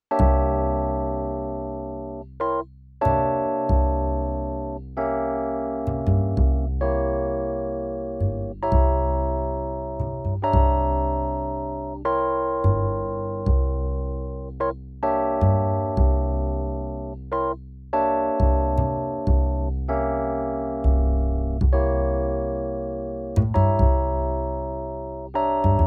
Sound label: C7 flat nine hold